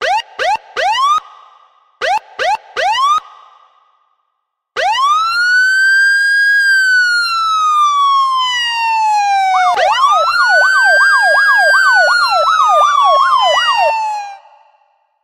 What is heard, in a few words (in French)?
Klaxon De Voiture De Police